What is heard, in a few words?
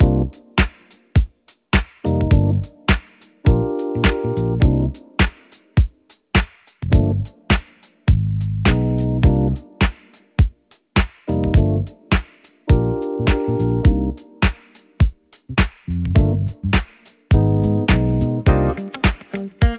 shop floor groove 8khz ulaw, hold music